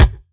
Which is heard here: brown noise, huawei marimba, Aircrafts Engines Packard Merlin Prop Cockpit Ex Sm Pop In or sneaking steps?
Aircrafts Engines Packard Merlin Prop Cockpit Ex Sm Pop In